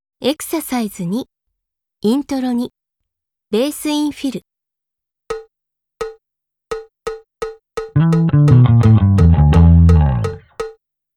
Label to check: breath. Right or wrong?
Wrong